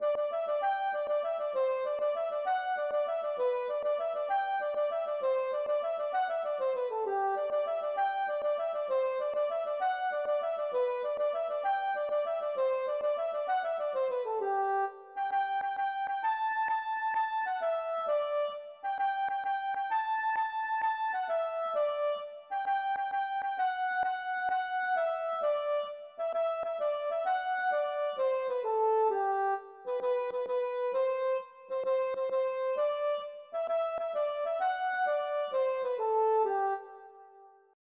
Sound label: jig